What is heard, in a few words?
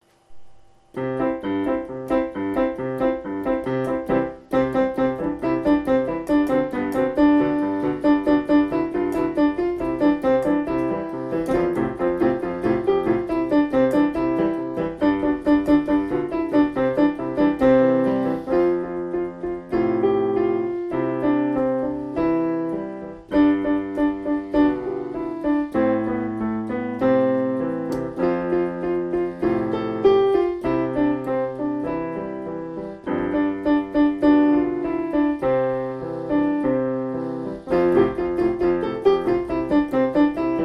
slow fast music